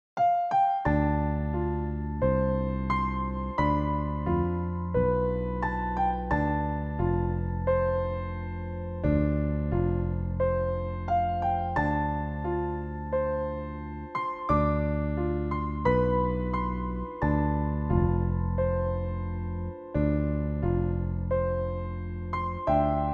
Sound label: Dm 88 BPM 4-4 with BASS